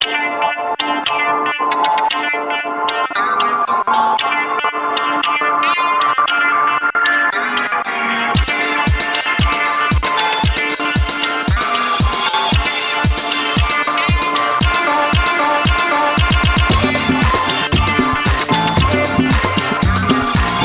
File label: lofi synth sound and groove